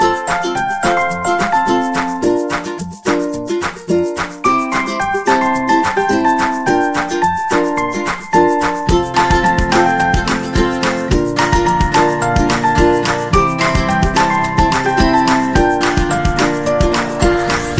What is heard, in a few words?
Holiday Upbeat, happy music